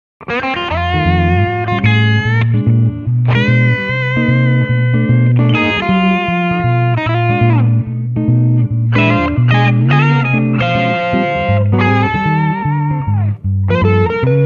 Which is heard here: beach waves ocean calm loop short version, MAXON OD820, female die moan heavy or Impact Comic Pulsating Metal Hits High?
MAXON OD820